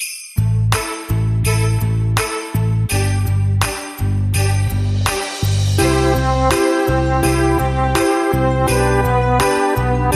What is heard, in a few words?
No Melody